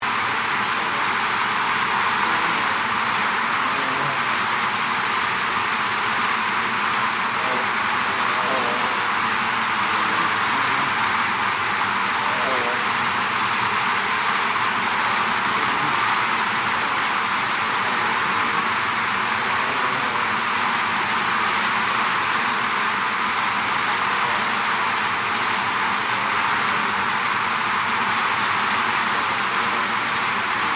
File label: Bee Hive, Animal, Bee, Hive, Wings, Buzz, Insects, Bugs; Angry; Bees